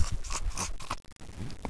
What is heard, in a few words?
evil giggle; svencoop; sapience